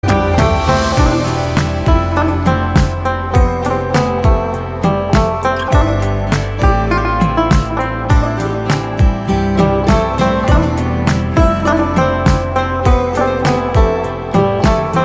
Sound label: Instrumental Song